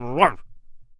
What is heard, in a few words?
Protector Attack Vocals